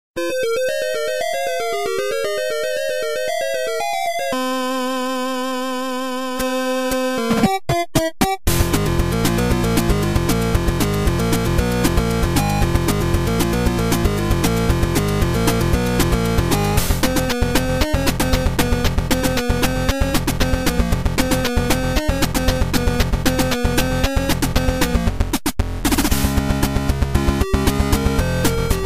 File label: 8 Bit; Mirai Nikki